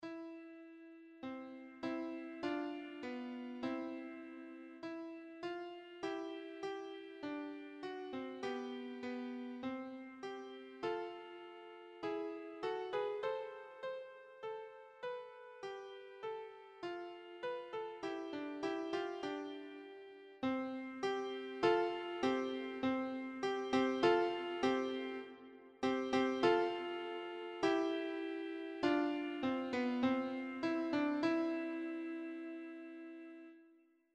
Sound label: piano